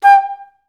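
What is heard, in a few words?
staccato G4; Best Service; Peter Siedlaczek's Advanced Orchestra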